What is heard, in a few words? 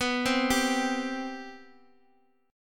C 5th B; C5/B arpeggiated